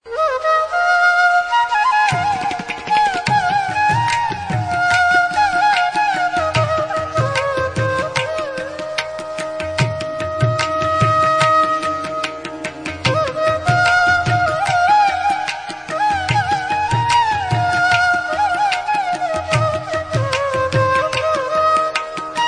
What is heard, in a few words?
classical, ringtone